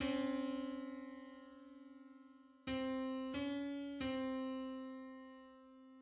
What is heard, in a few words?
Sixty-ninth harmonic on C